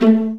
VA PIZZ A2; Best Service; Peter Siedlaczek's Advanced Orchestra

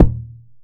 OKE-DO, JAPAN PERC; AKAI S6000 CD-ROM; WorldSounds

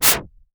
IMP WHOOSH

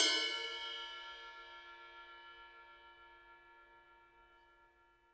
RIDE, RIDE CYMBALS; Spectrasonics - Backbeat

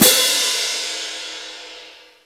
Orc Cym; Giga Samples Collection; Orchestra

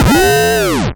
Misc Short FX

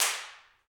PRC HNDCL, Clap; Rhythm Section; Clap & Snap; Clap Modules

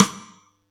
Yamaha EX-5

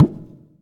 PERC, Dance Mega Drums; 90 s Sample CDs; Best Service

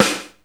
RX5 SNR; Hollywood Edge; The Big Bad Beatmachine

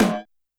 Tom Industrial, YAMAHA RM1X

